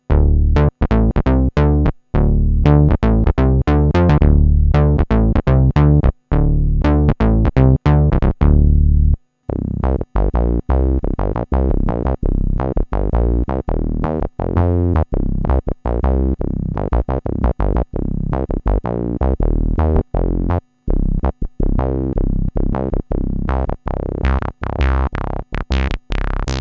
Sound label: jp 8 bass; Jupiter-8; arturia origin; sans aucun effet; basses